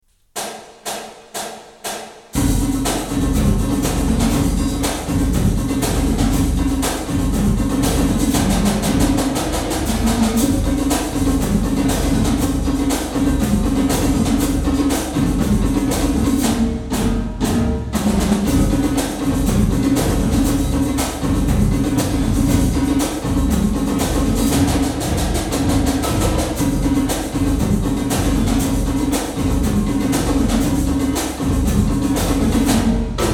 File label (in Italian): Cadenza percussioni; Cadenza delle percussioni in sfilata